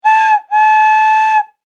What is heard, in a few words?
Toy Whistle; Whistle Sound Effects; Andrew Fleming